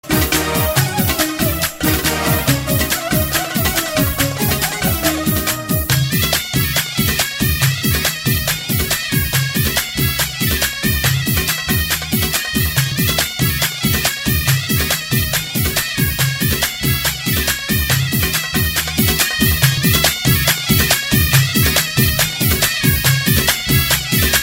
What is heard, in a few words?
Kabyle